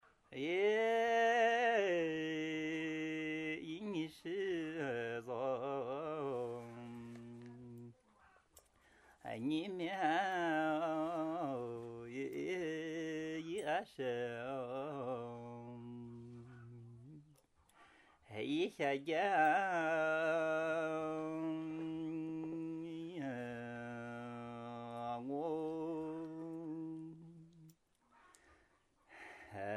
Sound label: duet, tribal